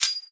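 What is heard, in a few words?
Bullet shell Shell Eject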